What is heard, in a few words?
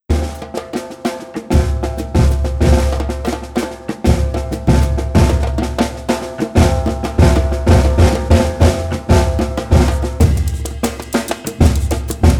rhythmic session maracatu